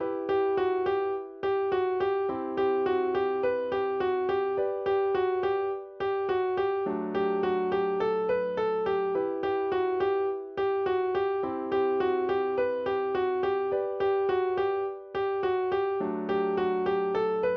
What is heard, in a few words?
instrumental